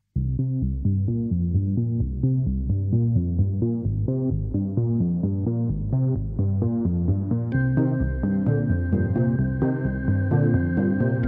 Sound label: curio music; Risk of Rain music pack; in-game music